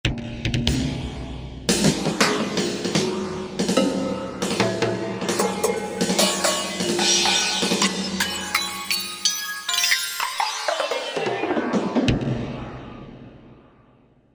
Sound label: Magic Drums, Korg MSC-8S Percussion